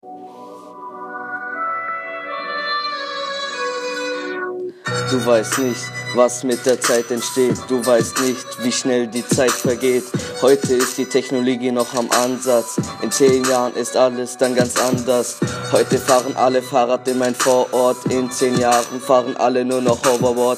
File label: Musik Rap Gruppe